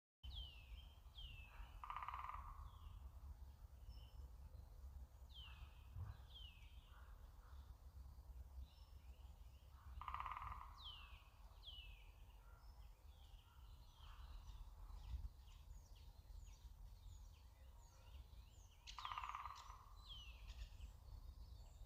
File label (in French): pic vert; dans la nature